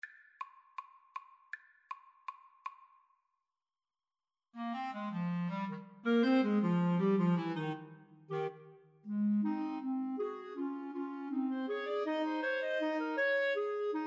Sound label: Clarinet Trio